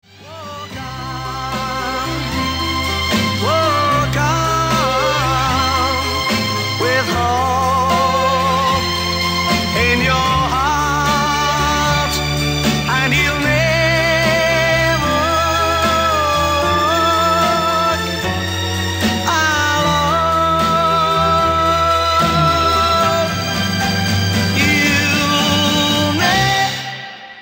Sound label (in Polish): Hymn Liverpoolu